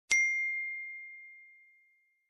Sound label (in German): soundeffect nachricht; whatsapp